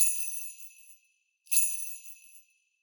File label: sleigh bells